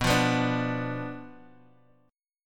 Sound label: B Diminished B Guitar Half Step Down